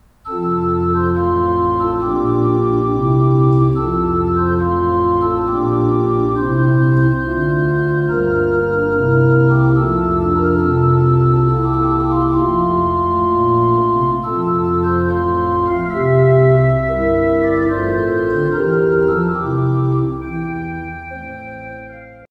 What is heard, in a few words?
Air from Water Music; G.F. Handel